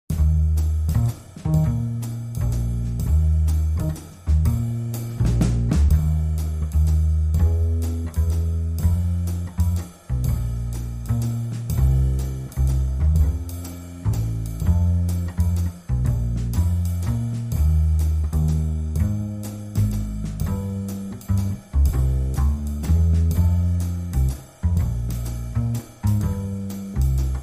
Bass and Drums

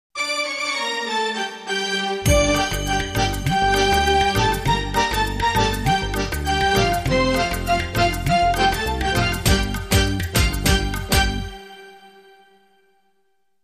Bhangra Beat

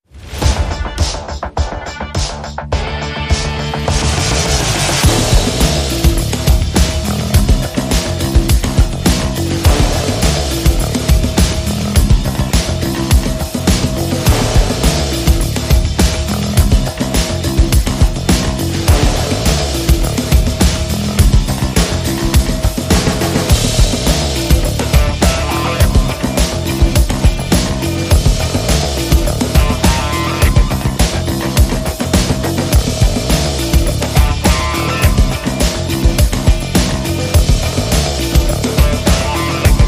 Rhythm

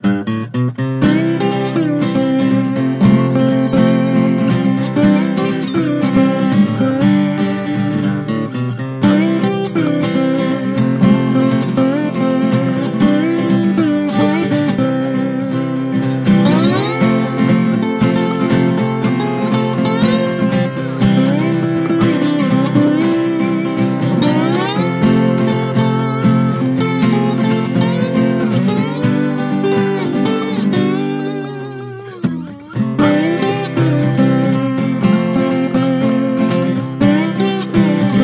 Happy Tom, No Drums And Bass

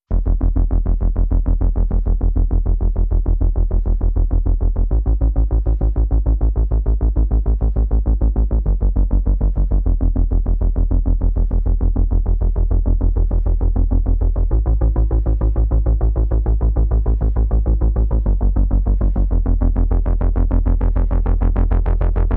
Bass

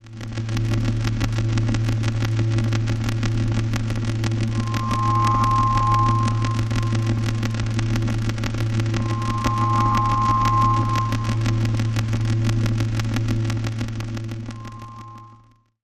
Static Prime Musical Pulsers

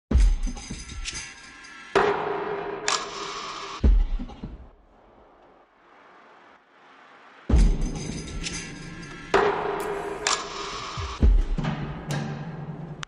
Drums Only

